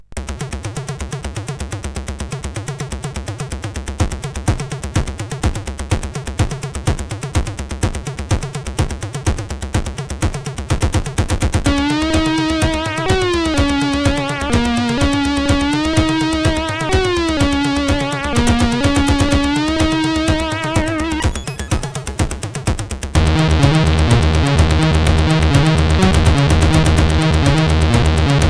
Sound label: CSG8580